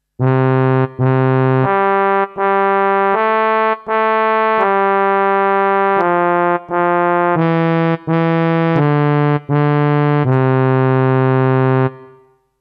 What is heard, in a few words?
tuba